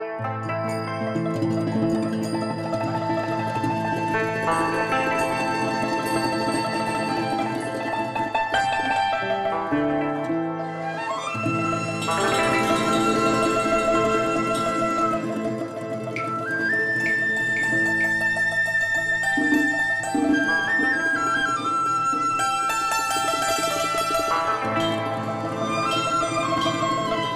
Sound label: TRADITIONAL Chinese, Picking Flowers